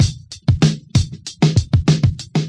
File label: lowpass sleighride, drum loops and breaks, 096 bpm; 091 to 100 bpm